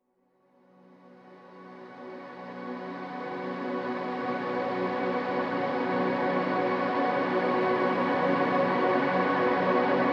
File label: 140 PAD LAYER Gmaj2; ambient